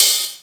Open Hihat

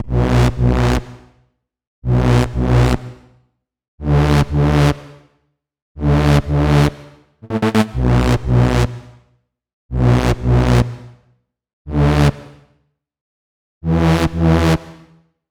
122 Bm Bass; Prime Loops; Complex Electro Synths; Amplify

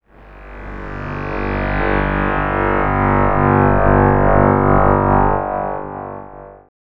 DUNGEON G1, dubstep; Loopmasters; Jack Sparrow; Deep Dubstep Progressions

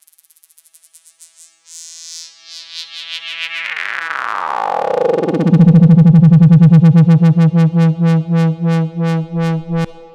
FX Downlifter

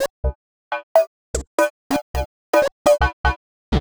126 C MUSIC; funky; Loopmasters; Funky Underground Vol 2

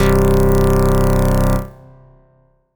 Synth Shot C

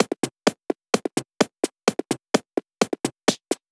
Loop, 128 BPM

